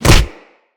Brawl Punch; Characters; Brawling

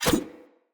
Large Dagger Whoosh; Snc Large Dagger

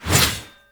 Heavy Scythe Whoosh Small; Warframe; Duviri Heavy Scythe

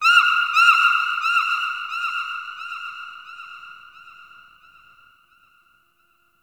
Forest Distant Misc Animal; Warframe; Grineer

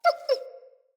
Wolf Cub Trapped Call Close; Vocals; Animals

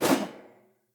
Polearm Whoosh; Weapons; Player; Sphere

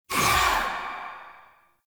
Choir Erupt Proj Throw

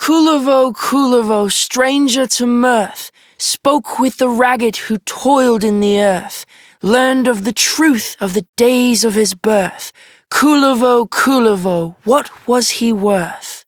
Child M, Childrens Rhymes; Warframe; Duviri; Kullervo

